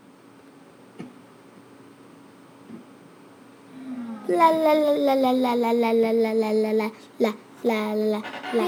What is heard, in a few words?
lalalalala